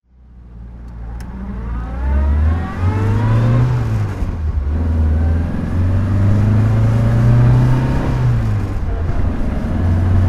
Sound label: mg midget 1275 driving